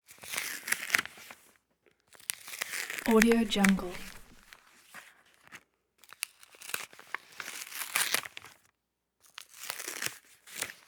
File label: envelope opening paper rustle peel apart sticky